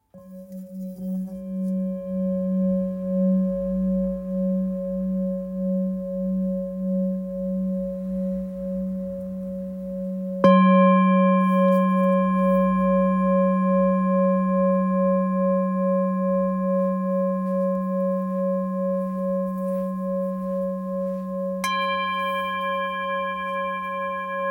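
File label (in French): Bol real full moon 1.1kg fa dièse, Bol chantant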